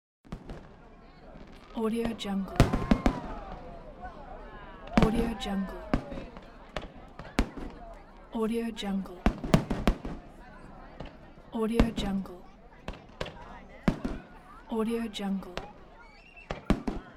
fireworks with crowd

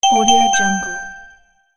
message received

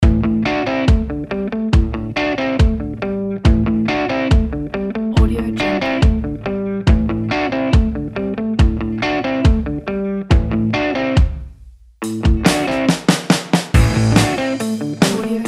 rock trailer kit

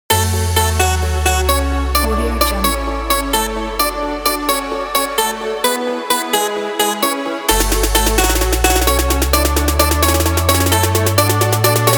pop trap

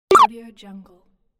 arcade hit; applehillstudios

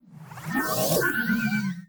servo morph hitech; soundmorph

